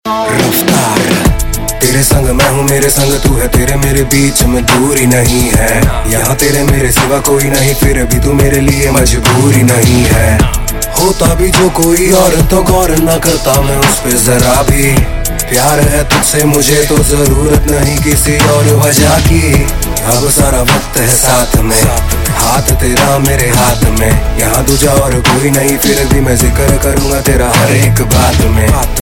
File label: rap